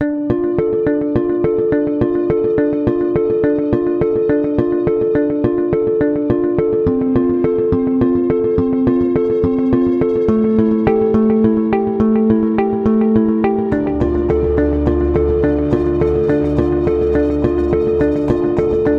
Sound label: Epic music battle